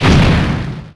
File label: land hit; Splashdown; XBOX; Impact